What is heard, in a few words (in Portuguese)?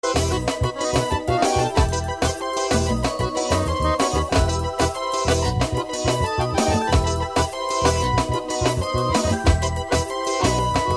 teclados